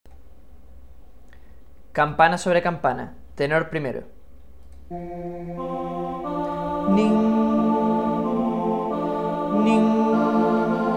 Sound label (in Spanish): Campana sobre campana Tenor I; navidad